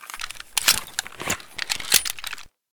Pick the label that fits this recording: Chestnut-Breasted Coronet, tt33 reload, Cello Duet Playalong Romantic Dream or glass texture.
tt33 reload